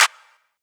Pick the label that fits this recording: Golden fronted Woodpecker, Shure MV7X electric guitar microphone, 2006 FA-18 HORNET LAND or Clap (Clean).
Clap (Clean)